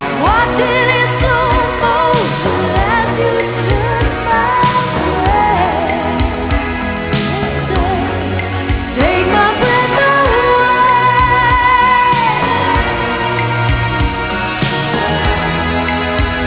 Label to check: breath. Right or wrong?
Right